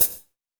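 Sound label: hi-hat, drums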